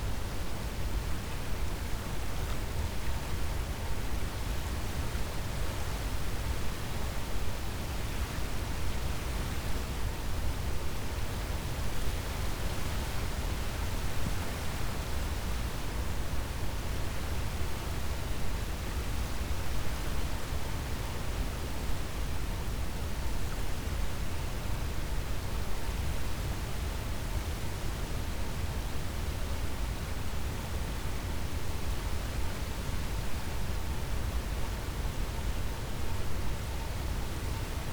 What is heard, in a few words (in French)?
cachalot negatif, Physeter Macrocephalus